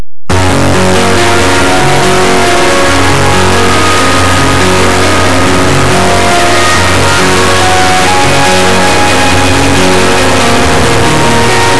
musica newage

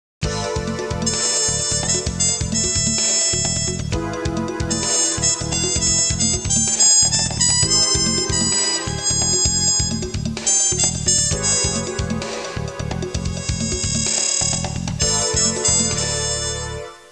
suonerie musica jazz

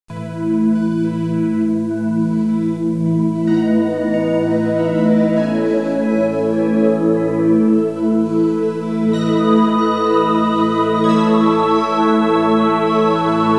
audio suonerie musica mistica

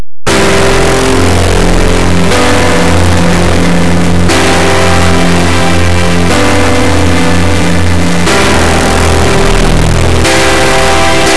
musica newage

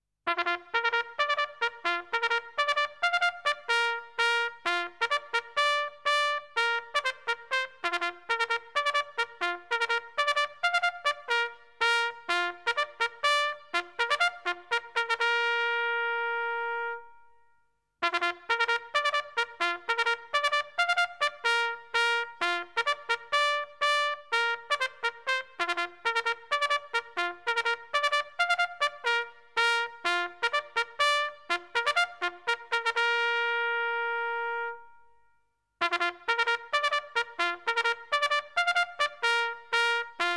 suonerie tromba militare

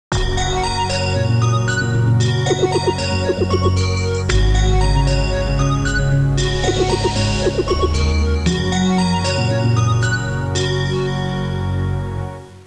musica elettronica; electronic

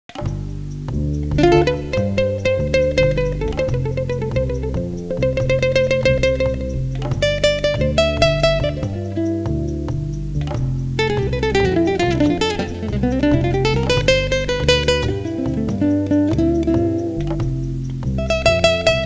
suonerie musica jazz

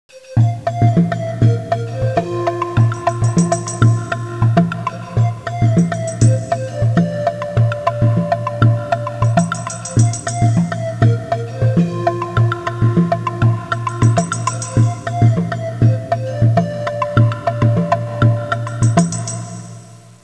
suonerie musica ritmica

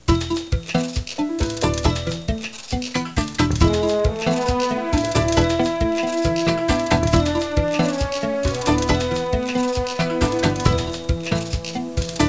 suonerie musica jazz

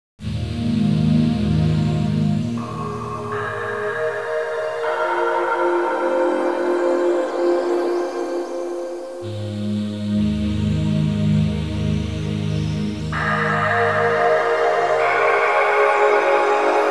audio suonerie musica mistica

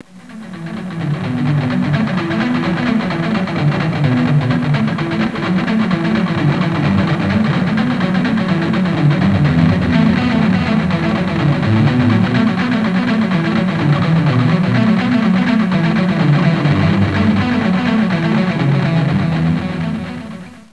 suonerie musica rock

